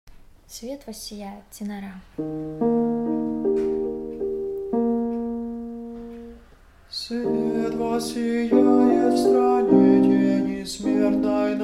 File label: tenor; Svet vossijaet